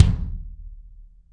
BD AMBIENT, Drums; Real Mega Drums

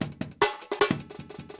SNAP LOOP; Yamaha DTXpress-II

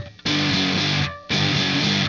Guitar, Indie Rock; Sonic Foundry (Sony Creative Software); Indie Rock Anthems; Session 06 - Haze

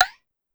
Conga Slap Up More, Pitch Env; Clavia DDrum-AT; Pitch Env Various

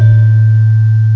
GENDER, MALLETS; M8; CMI Factory

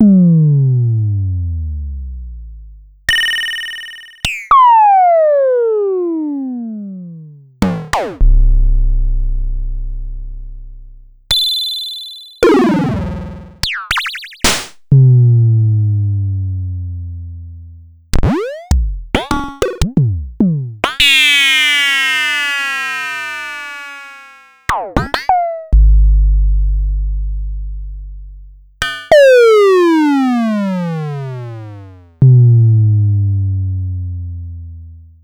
Pollard Syndrum; m8

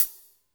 Cym Wetter, Drum Machines Alesis D4

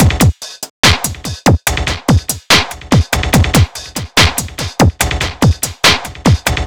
Crunch, Drums Beats

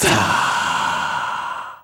Male Voice, African Voices

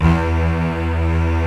LO ST STRING

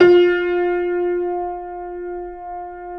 Keyboards Acoustic, F3; USB Soundscan vol.17; AKAI

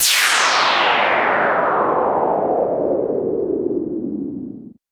Sweeps Down; Vengeance - Essential Clubsounds Vol.4; Effects

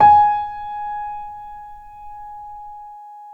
PNO MF; Synclavier Sampler Library

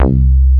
ANALOG BASS; SAMPLE WAVE MIXING 1

